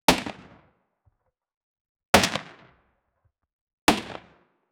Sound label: bow and arrow shooting; archery